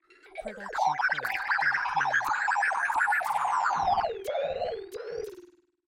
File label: control panel gone haywire; soundscrate